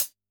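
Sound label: Closed Hat; drum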